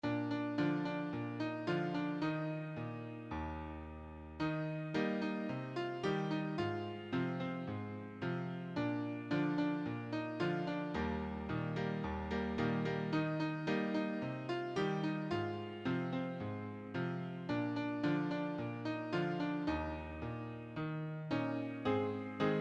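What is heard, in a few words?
You must be born again piano accompaniment; church and sunday school music; Hymns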